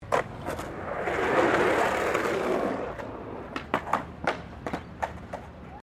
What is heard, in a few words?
SKATEBOARD SKATE BOWL START STOP; Skateboard sounds; Skateboarding (Sound Effects:Sports); SoundEffects-Foundation4